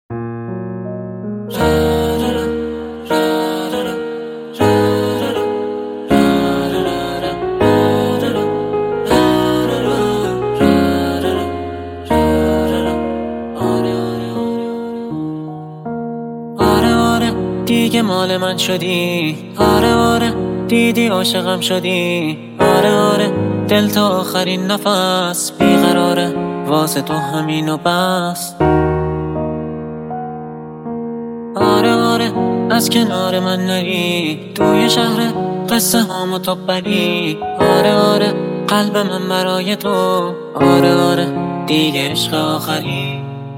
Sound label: Piano Version